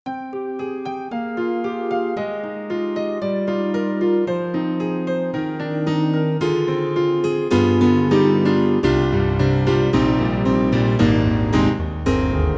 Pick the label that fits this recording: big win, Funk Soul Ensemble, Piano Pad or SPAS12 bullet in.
Piano Pad